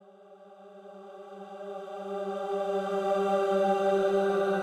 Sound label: Reverse FX